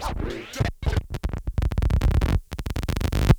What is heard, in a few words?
Scratch Tape; FX; Ambient Grooves